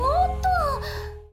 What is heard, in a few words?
combo break; osu